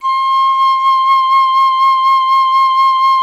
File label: FLT PIC., Solo Instruments; Miroslav Vitous; Solo Instruments VOL-2